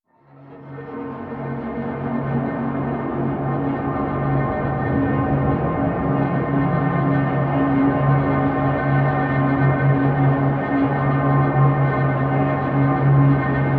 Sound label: DRON